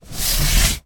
car steering wheel hand rub movement; transportation; sound effects; sound effects one

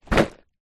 food candy inside card box down on surface; zapsplat

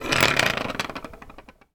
office pen ball point biro roll across table; sound effects one

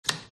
switch on

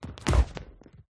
Whoosh Footsteps Punch Processed; lesser vibes

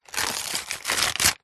foley paper bag small thin rip tear; zapsplat

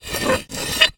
metal rusty heavy hinge movement squeak; foley; zapsplat

